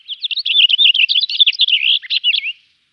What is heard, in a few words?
Music Frontend